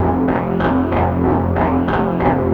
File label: guitar angry, Jazzmatazz loops